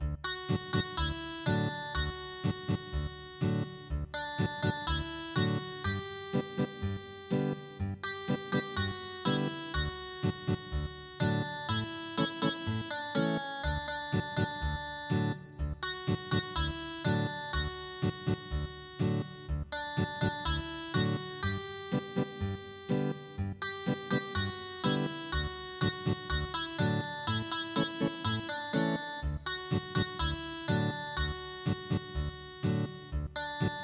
Ethnic Guitar; midi; traditional

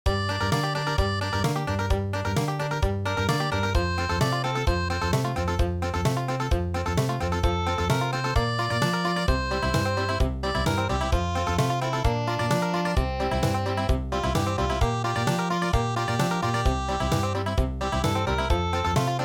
Country

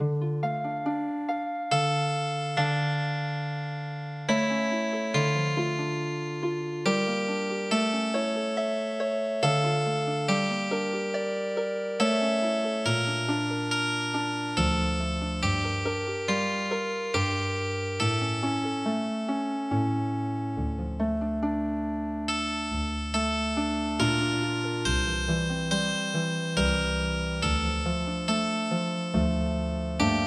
Healing Harp, traditional

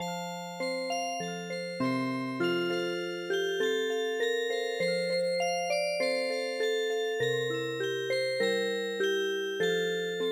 polka Music Box; traditional

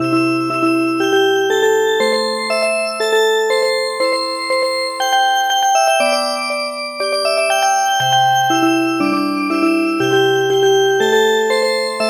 Music Box, midi traditional; Window fly

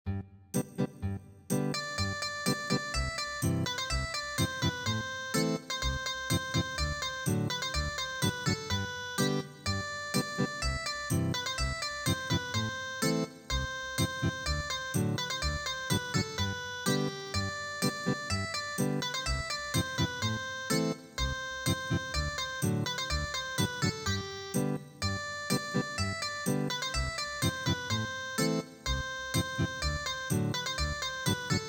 traccas Ethnic Guitar

